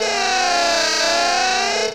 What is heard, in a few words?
Fx Vox; Equipped Music; Loungin House